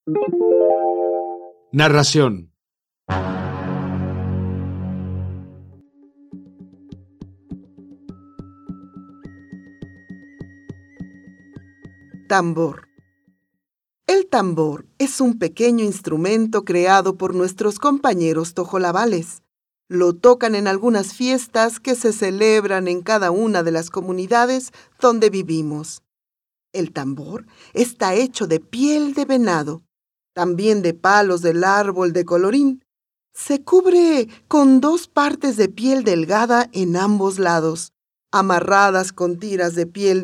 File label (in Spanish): Tambor